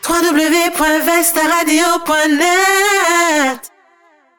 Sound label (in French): Top Horaire Jingle chante; vestaradio